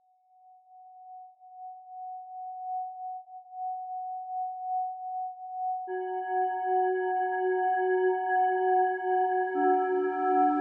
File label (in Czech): Solfeggio frekvence 741 Hz; Krcni cakra